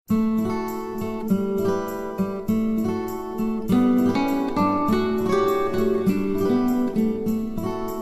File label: Mild Melody